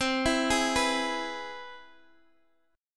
C Augmented Major 7th, Guitar Half Step Down, chord